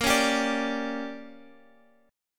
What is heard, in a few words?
D Major 7th Suspended 4th Sharp 5th, chord